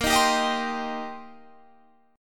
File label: C Minor 7th Bb